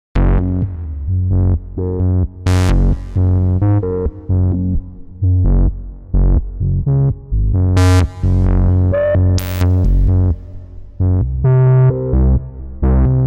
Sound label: Stable Unstable; Gforce AXXESS